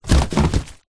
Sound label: present wrapped box fall over; foley; zapsplat